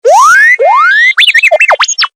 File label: Urgent Warning; Motorola; Droid 2 R2 D2